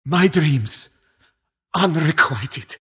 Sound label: death; skywrath mage